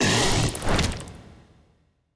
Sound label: cutholoids attack; Stellaris; grand archive; ships